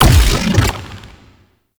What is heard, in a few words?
Octopede Lash Limbs Beam Stop, Enemies; Warframe; ManInTheWall